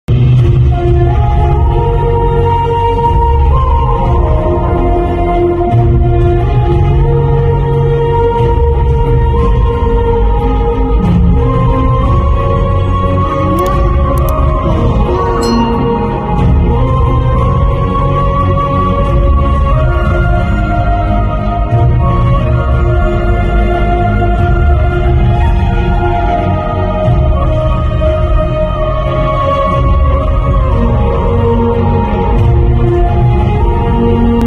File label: sad bgm ringtone; Hi Nanna